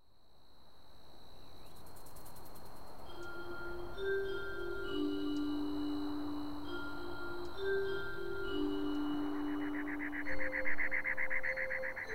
ambience Snow Moon

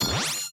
item use

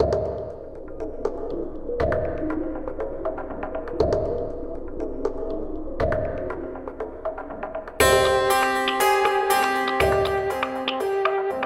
BGM Dungeon

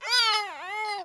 voice miss; Poteko

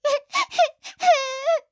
voice Cry

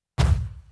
Attack leopard; Pochi

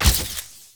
Magic Circle Out; battle item

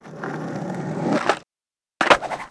skill item attack

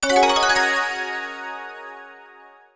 item open; FantaraGacha

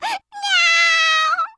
voice Cry; Pochi

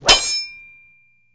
item upgrade

